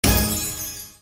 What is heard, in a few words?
service special stop; Big Joker